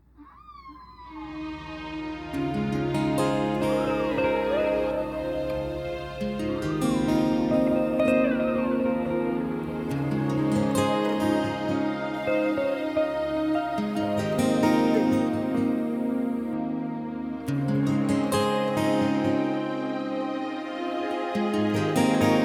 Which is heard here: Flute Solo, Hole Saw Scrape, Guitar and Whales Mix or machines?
Guitar and Whales Mix